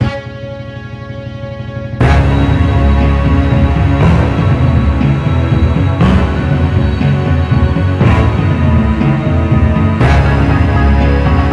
Story End; Hunter's Legacy Purrfect Edition

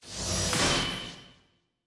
Battle Start

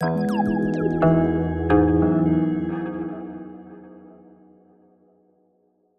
stinger intro

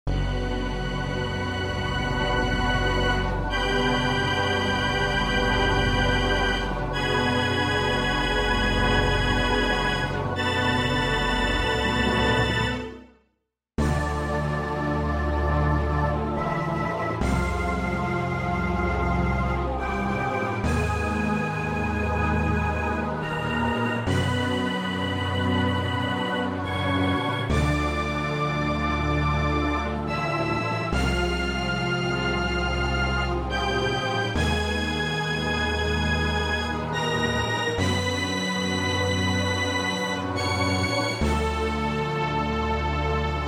MIDI Battle

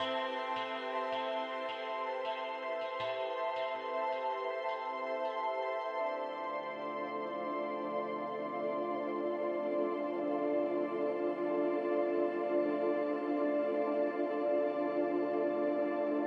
Carbon Ambience; Landinar Into the Void

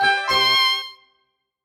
Beats Pickup; Sparklite

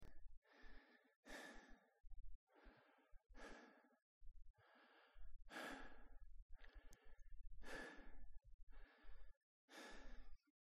Out of breath; The Joy of Creation Halloween

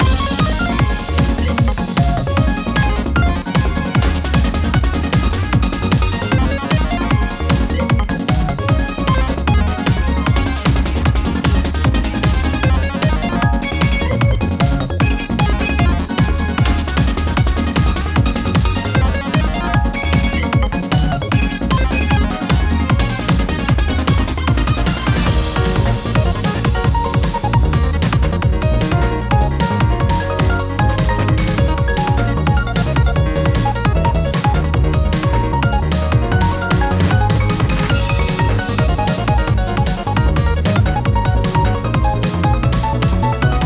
bgm coin; Pac-Man Championship Edition 2

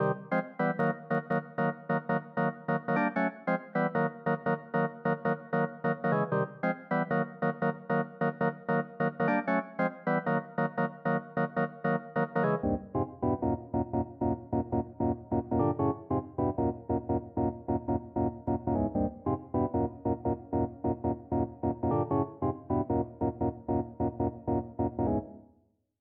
Chords; chippy

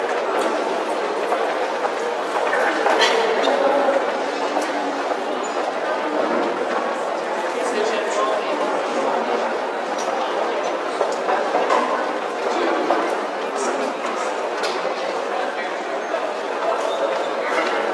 Fem Pasv Many Corridor

